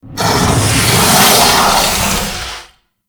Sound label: Forest Water Pump Retract; Props; Warframe; Grineer Forest